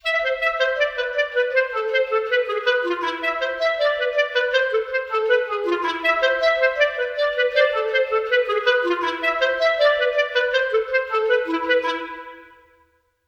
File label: Clarinet